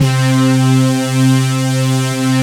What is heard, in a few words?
BRAS, Moog Synths; ORSON; E-MU Formula 4000 Series Vol. 3 – Analog Odyssey